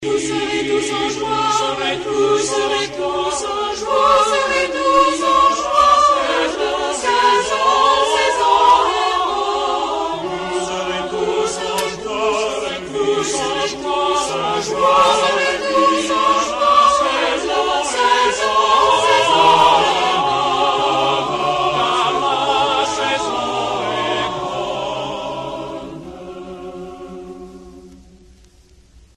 Le chant des oiseaux 1er refrain; Clement Janequin

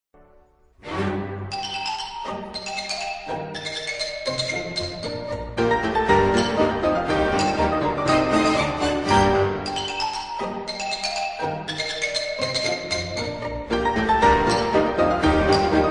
Percussions xylophone; Le carnaval des animaux "Fossiles"; Camille Saint-Saëns

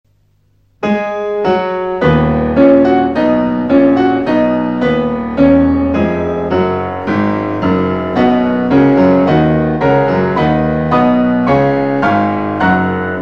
Tableaux d une exposition Promenade 1 piano; Promenade 3; Modest MOUSSORGSKY

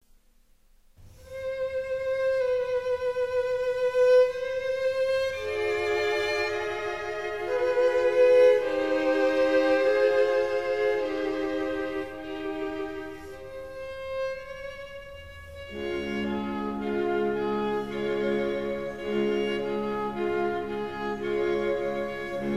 Quatuor Larghetto; 3e mvt; Luigi Boccherini

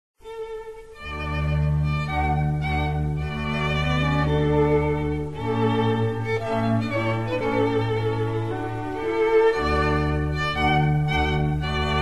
Quintette; La Truite; Schubert

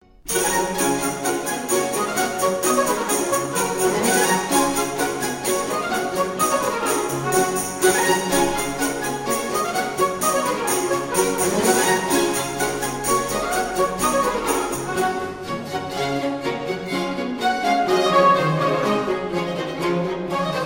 Bourrees Renaissance; Michel Praetorius

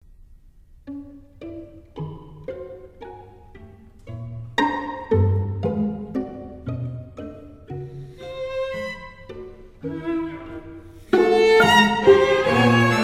Quatuor a cordes; Gemachlich; Anton WEBERN

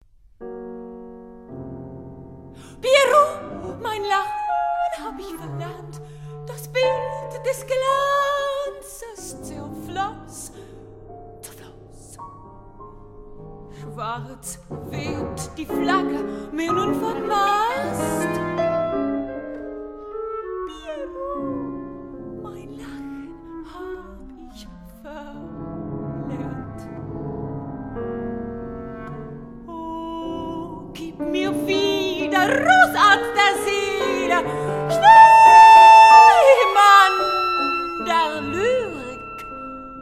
Pieces pour piano Bewegt anime; Arnold SHONBERG

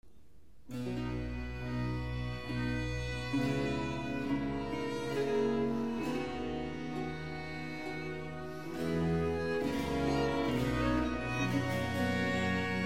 Ombra mai fu, contre-tenor; Serse; Georg-Friedrich Haendel

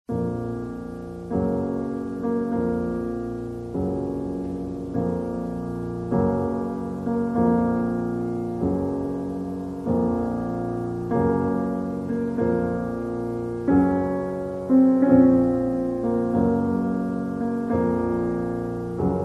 Marche funebre; Chopin

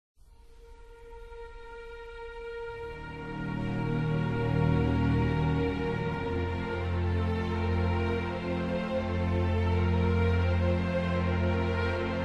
Adagio pour cordes; Barber

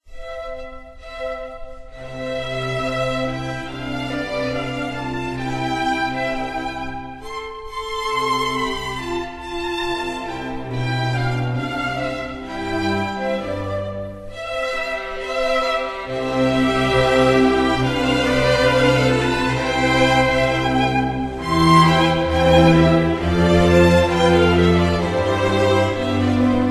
Serenade; La petite musique de nuit; Mozart

